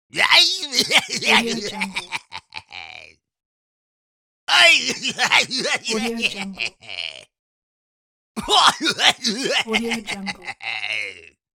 cartoon funny monster laughs

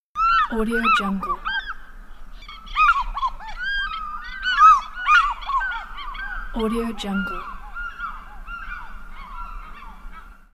african fish eagles calling

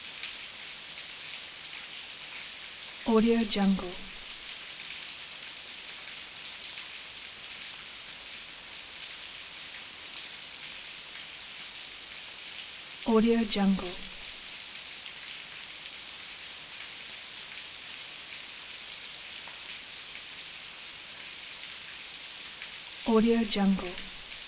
heavy rain

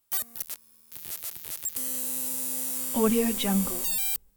beeps

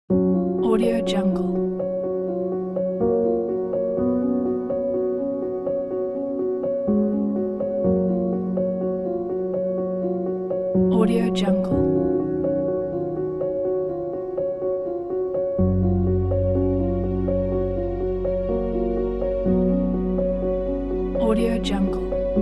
sadness piano background

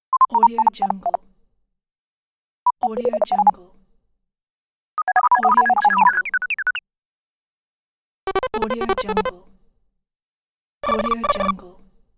fun game sounds

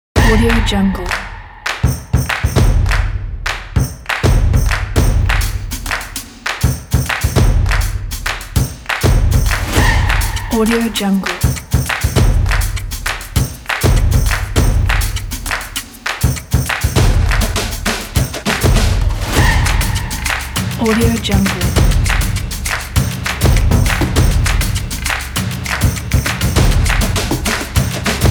drums

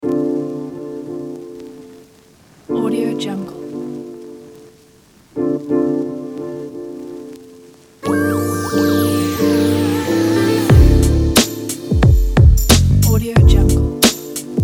soul

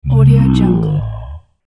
dinosaur large herbivore

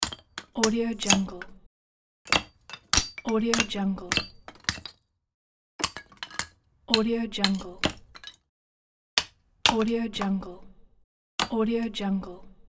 wood chopping

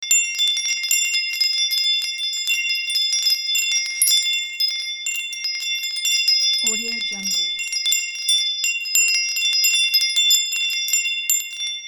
wind chimes loop

